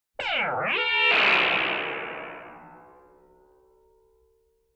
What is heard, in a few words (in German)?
ufos zusammenprallende partikel; Science Fiction